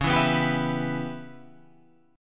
Db Minor 7th Flat 5th Db down Guitar Open F; strummed

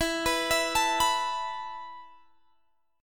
E Suspended 4th E down Guitar Drop D

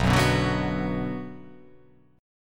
B Minor B

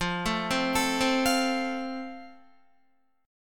F Major, Guitar; arpeggiated

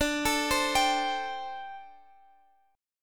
A Minor 7th D; arpeggiated

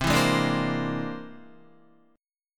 C Augmented 9th, Guitar; strummed; JGuitar

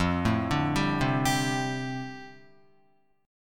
F 6th Add 9th, Guitar, F6add9 chord; F6add9 arpeggiated

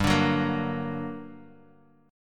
G Minor Major 7th, down, Guitar, DADGAD

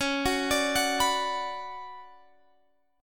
D Major 13th C sharp, chord

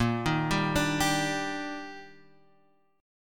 D sharp Major 7th

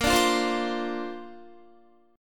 A sharp Major Add 9th, Guitar; A#add9; strummed

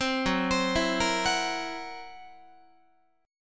Gb 7th Flat 5th Gb Guitar; 15,14,15,14,14,17; Listen to Gb7b5 arpeggiated

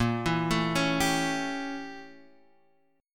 A 7th A sharp, chord; A7/A#; arpeggiated; JGuitar

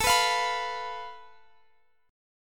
F Major Flat 5th A, guitar chord

